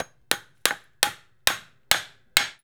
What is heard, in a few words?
hammer